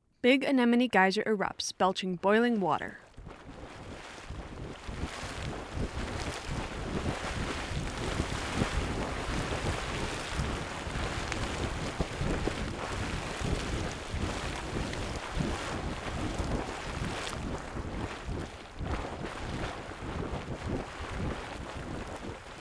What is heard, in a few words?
Anemone Geyser